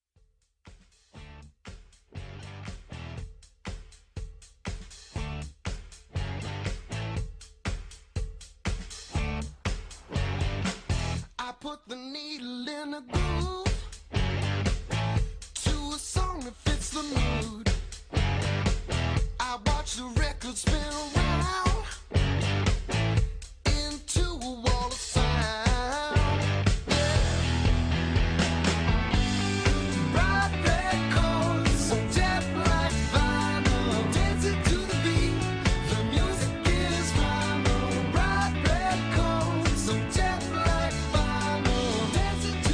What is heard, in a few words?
Bright Red Chords